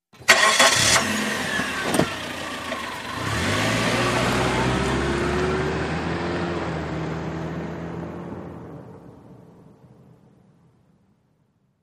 Sm Car Acc Park Manvr, compact

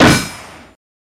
Bullet Impacts Ring, metal

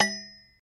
Bullet On Copper Tub, shell casings fall

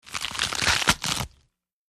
Lettuce Crunchy Rip

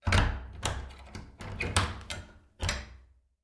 Door Skeleton Key

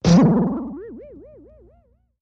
Bing, metallic, insecty, vibrato up; bings

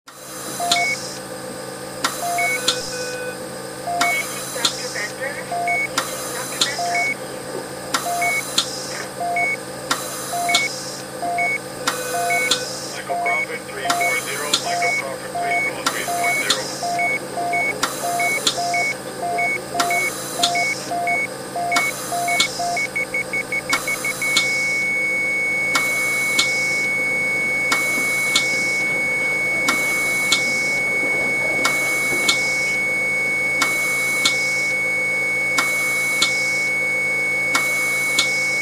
Hospital Ambience, heart monitor

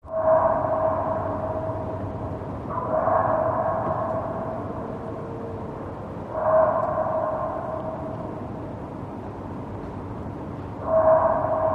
Foxcry Ambience

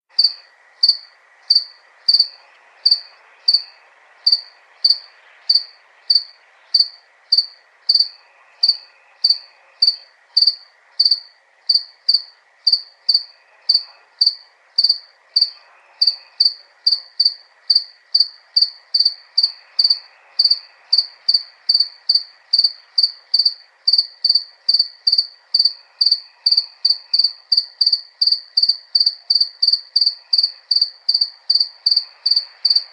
Crickets Solo Chirp

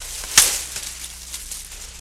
Brush Crunch Sngl, trees

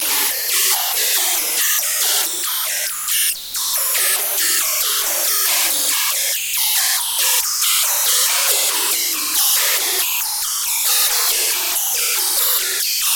Oberheim Matrix Atm, sci fi ambience

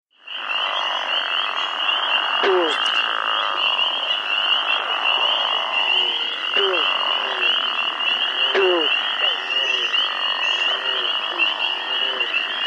BG Swamp Bullfrog

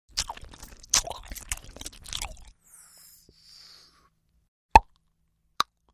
Bubbles Loud Gum Chew, bubble gum

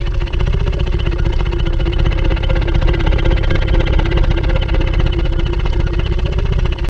Tiger Low Growl; big cats

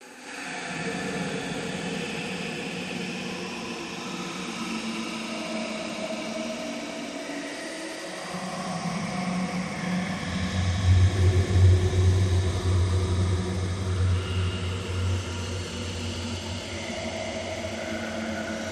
Steam Scare Noise, air

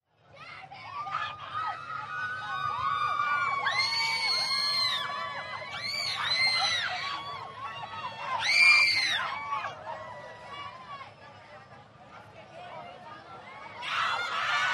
Crowd Teenage Girls, medium

